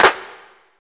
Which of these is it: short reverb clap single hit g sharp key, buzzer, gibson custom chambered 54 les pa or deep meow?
short reverb clap single hit g sharp key